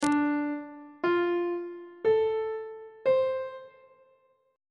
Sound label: c mixolydian 7th chord 2 is d minor 7th on treble clef